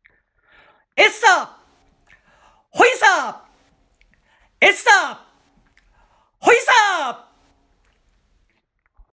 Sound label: voice essa hoisa